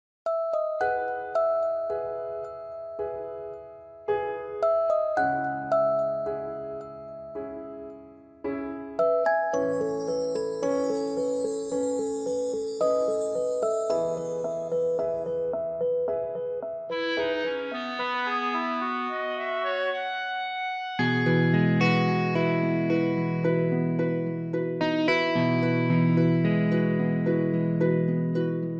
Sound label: sms